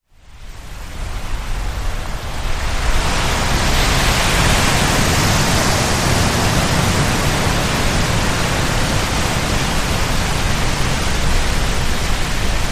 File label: Surfer Paradise Atmosphere